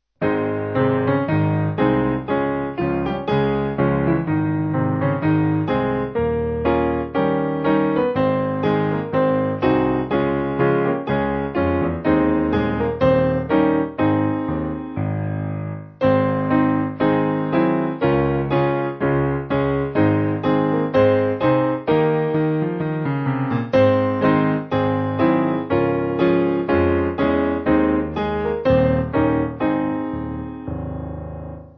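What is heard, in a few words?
Piano, church music backing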